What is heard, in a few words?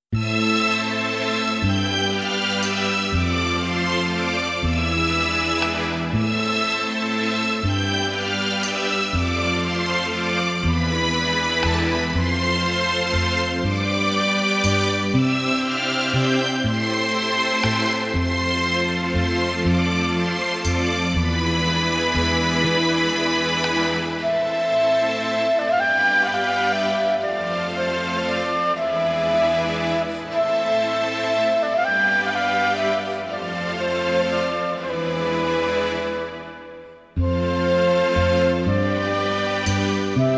Strings version; Rurouni Kenshin Meiji Kenkaku Romantan